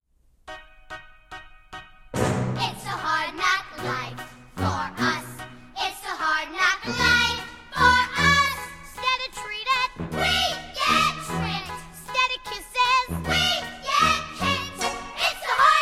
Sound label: Vocal Guide